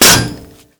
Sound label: utilities metal layer enhancer